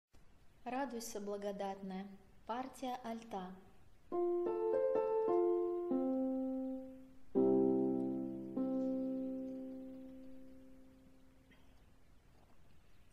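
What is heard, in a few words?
alto; Radujsja Blagodatnaja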